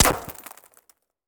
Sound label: Bullet Ricochet; Sound Ideas; Guns